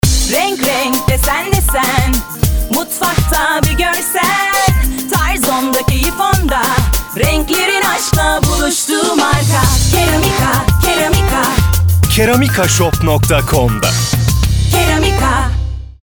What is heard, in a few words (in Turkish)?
Jingle, Radyo Spotu; Stop Keramika; keramika radyo reklamlari